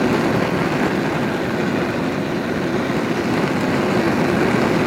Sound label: Carburant Consum